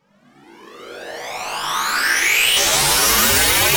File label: Sweeps Medium